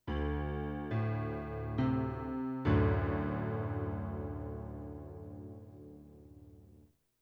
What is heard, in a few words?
Jingles